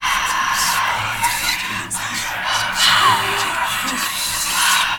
Chant

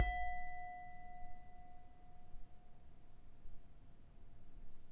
Large Glass

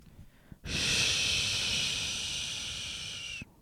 Shush Better

